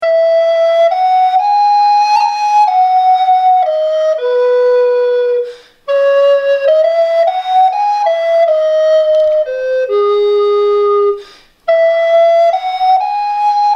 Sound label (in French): FLUTE A BEC